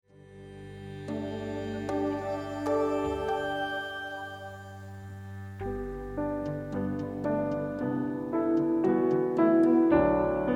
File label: pavane; 亡き王女のためのパヴァーヌ; Smile on you